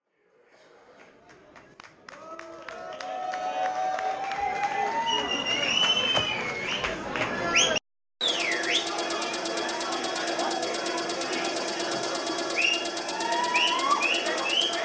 techno; techno tuesday; melkwek amsterdam

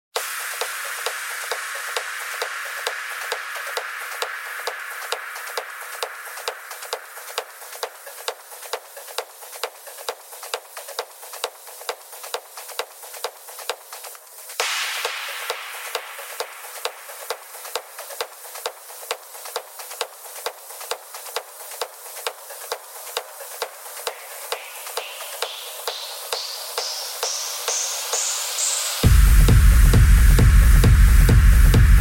techno

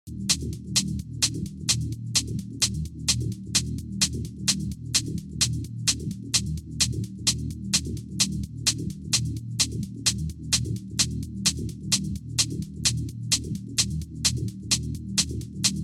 techno live sets; this is techno

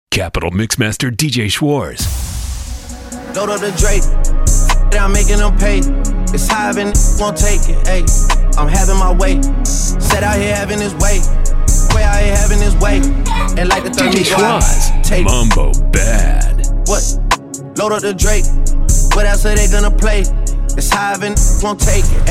trap drill rap; pitalfm the hype

